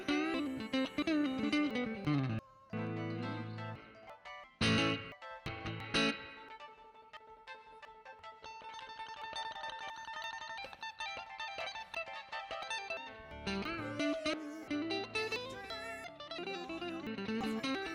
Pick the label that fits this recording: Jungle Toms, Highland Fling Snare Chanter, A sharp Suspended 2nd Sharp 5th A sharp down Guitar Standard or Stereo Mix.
Stereo Mix